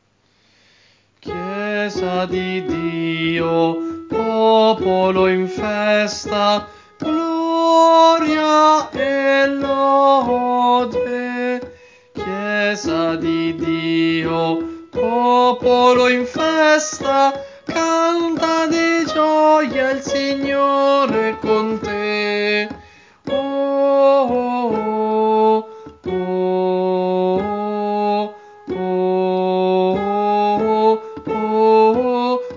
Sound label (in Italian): Chiesa di Dio Tenori; Coro Isola del Giglio; Giovedi Santo